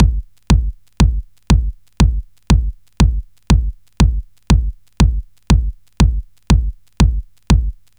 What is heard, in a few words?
Kick